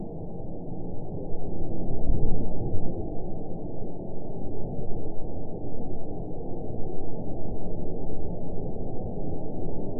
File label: dry desert wind howling, landscape